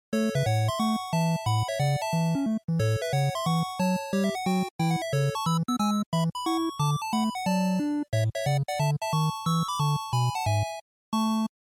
NES Sports Title

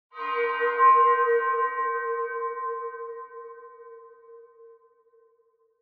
Horror Accent Far

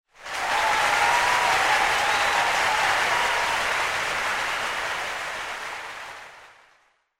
Cheer Crowd, Low Mid

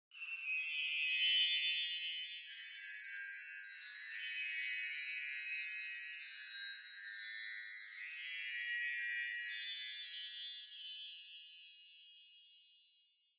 Wind Chimes Reverb Mid

